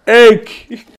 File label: egghhh